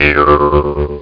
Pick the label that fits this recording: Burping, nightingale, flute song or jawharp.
jawharp